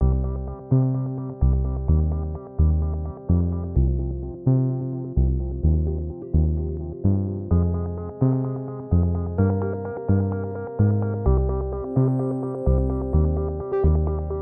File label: ambient occlusi